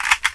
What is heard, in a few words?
reload